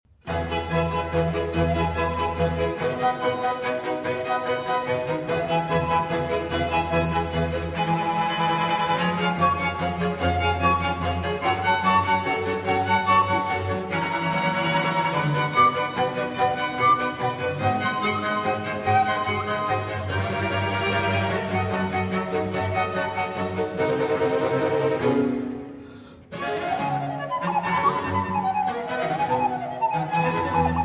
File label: Flute Concerto in G Minor